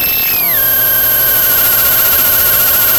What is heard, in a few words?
Sound of a cat meowing